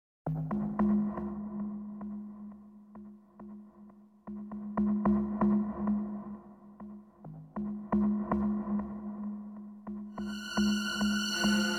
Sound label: Music Suspense; nancy drew the phantom of venice windows gamerip 2008